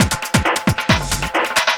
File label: LOOP, Drum & Bass, 135 BPM; Ueberschall; Drum & Bass Flash Trackz